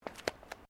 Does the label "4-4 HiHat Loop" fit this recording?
No